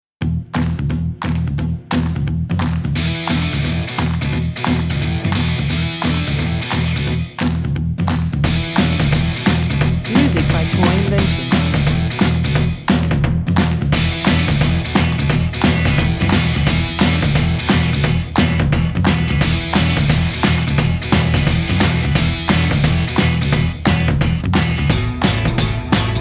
Indie Pop no vocals

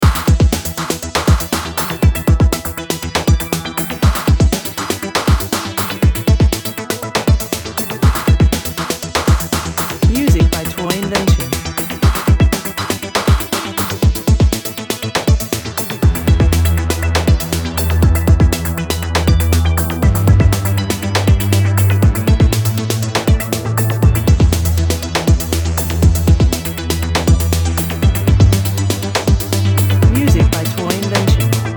Breakdance Contest loop

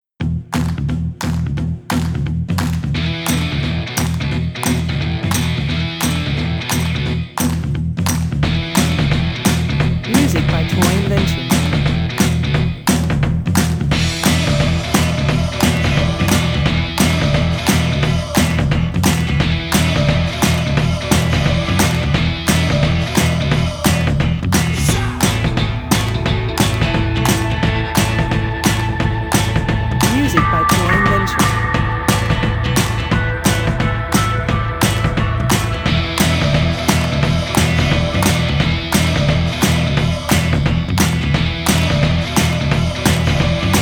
Indie Pop

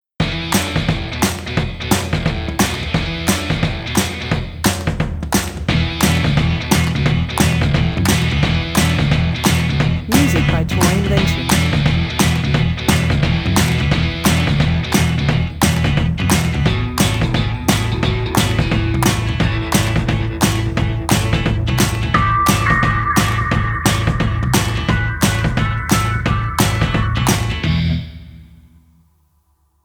Indie Pop no vocals and pads

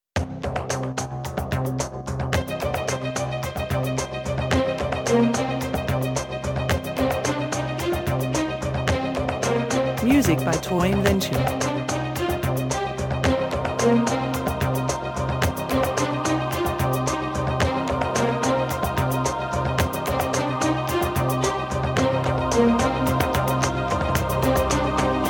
Cinematic Trailer

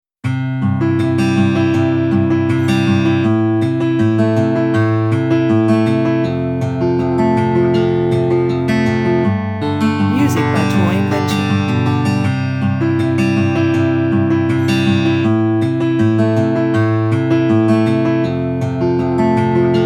Campfire

